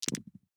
DICE on Felt, Throw and Roll, Standard, 4 Four Dice; Samples; ArticulatedSounds; DICE Sfx Party (Extended)